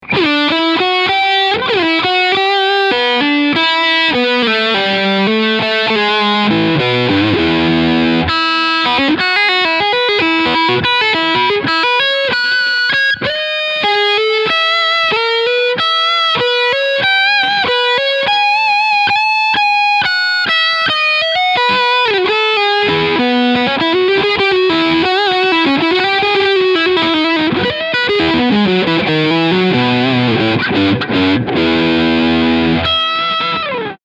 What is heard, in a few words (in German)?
Leadsound Steg